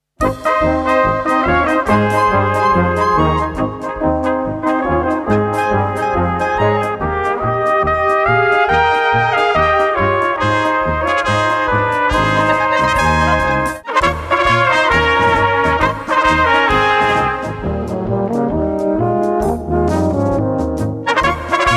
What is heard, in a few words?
POLKA